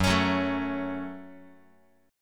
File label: F sharp Minor F sharp, Guitar; strummed; JGuitar